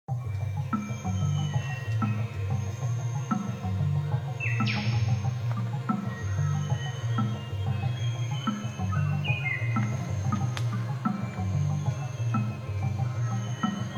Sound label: Safari background music; Nokia; audio themes